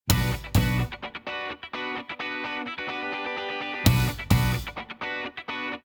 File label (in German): Jingle